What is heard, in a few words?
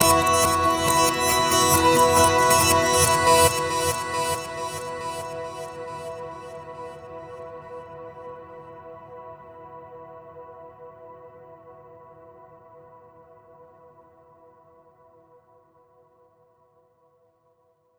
Overlay Tone